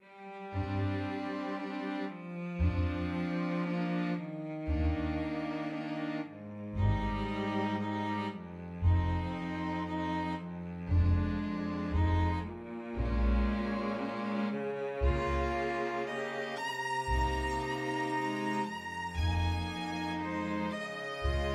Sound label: PLEDGE for String Quintet